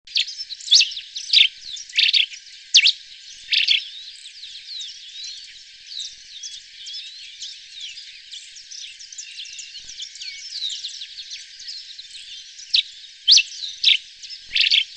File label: grey crested finch